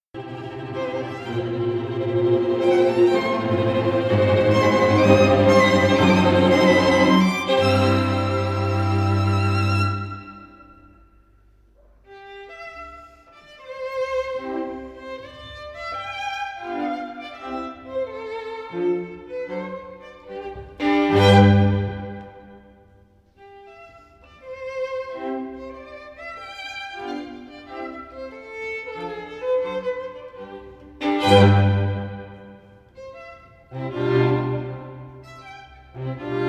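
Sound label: Beethoven string 4th movement; Beethoven - String Quintet in C major; Festival Montalcino 2012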